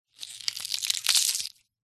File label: animals insect cockroach crush squash crunch